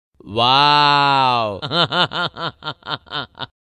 waawwwwww with laugh; ringtones; sms

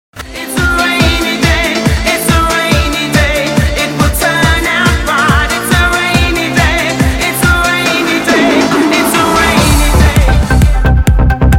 rainy music

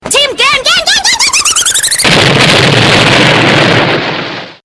Quintet; Madoka

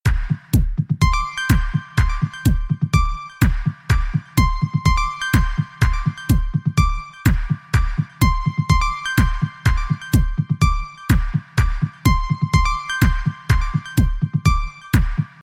Bells

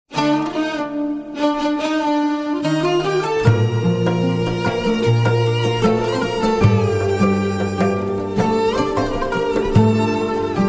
relax music

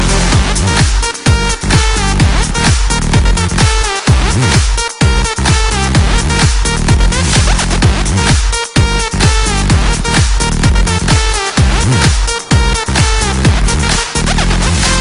Rock Hop

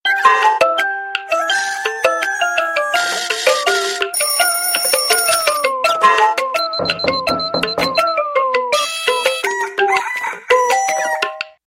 Xperia Multi Alarm

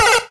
Downed; SMB3

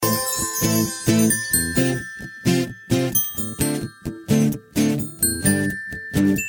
Sms Melody

